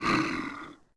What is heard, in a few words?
Bear Fort Vox; Civ4 beyond sword